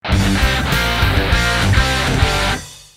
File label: Hard rock email alert; Nokia; audio themes